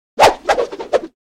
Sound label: Swish Multiple Swish Very Fast; Crashes Impacts